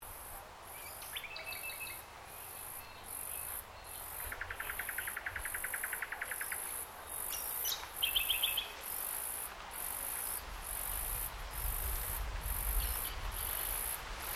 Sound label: Ambience Yandina Botanic Gardens Birds Insects, Botanic gardens in Yandina Queensland